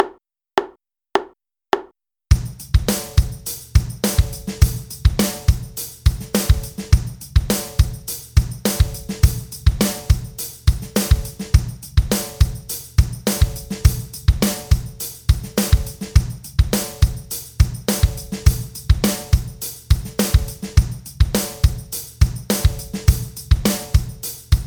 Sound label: Drum 104 Bpm